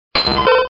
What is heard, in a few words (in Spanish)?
Grito